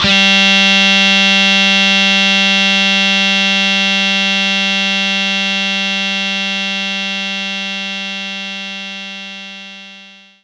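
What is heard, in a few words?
Distortion Guitar G3; knights try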